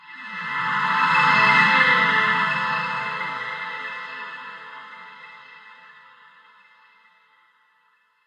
D&B Pad; neuro

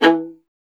STR VLA, Solo Instruments; Miroslav Vitous; Solo Instruments VOL-1

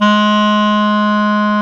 WND CLAR2, Clar Jazz; Roland LCDP04 Orchestral Winds; 90 s Sample CDs; Clarinets

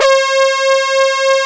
OBX BRASS; WS#4 ROCK

